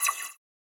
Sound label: scifi weapon blaster accent